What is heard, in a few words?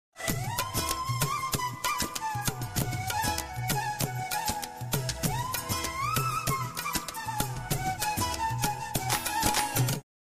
Sad Flute Bansuri